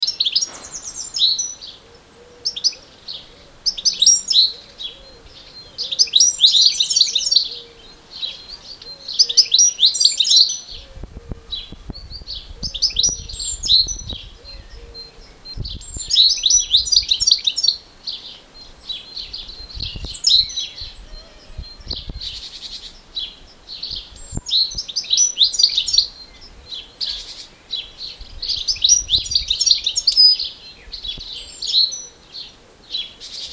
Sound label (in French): Chardonneret élégant Carduelis carduelis carduelis